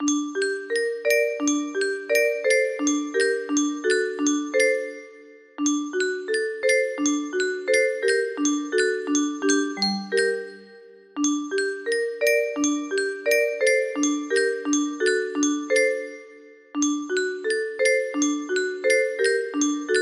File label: locket, music box; sailor moon